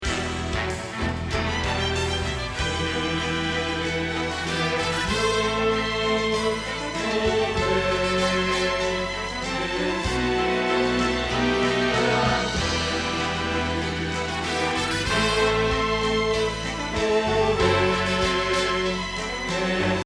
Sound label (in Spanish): Bajo